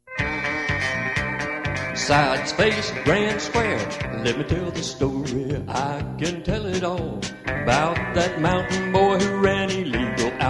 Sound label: vocal; square dance music